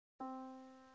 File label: yamaha Disk